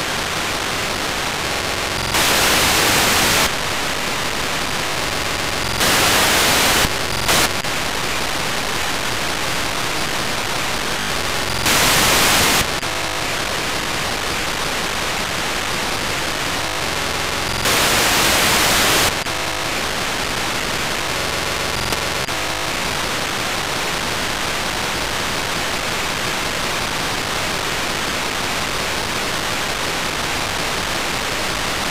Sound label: sdrsharp wfm 9khz; deemphasis